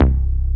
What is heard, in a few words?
AMB BASS; WORS STATION; AMBI BLEEP